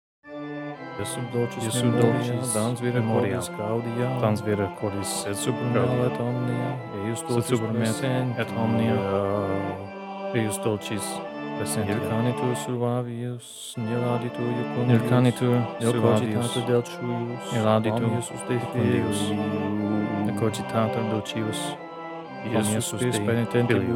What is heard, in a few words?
Motet; Jamuary; Jesu Dulcis Memoria